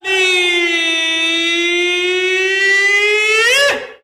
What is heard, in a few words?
"eee"; Voice; ooh ahh